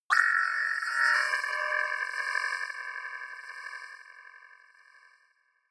creepy modulator short

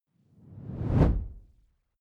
whoosh simple hard incoming

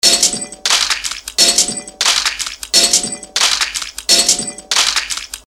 machinery loop

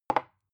glass put down tipping fast

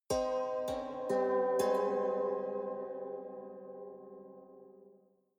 haunted strings sequence soft

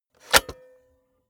cassette player slide tray insert soft

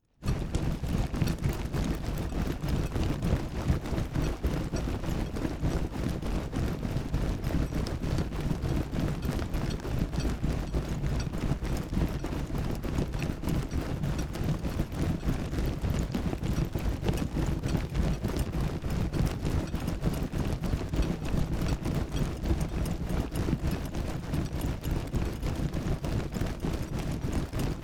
troops leather rustle run

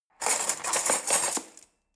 cutlery forks drawer grab

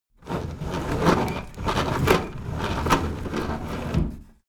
window roller shutter close fast

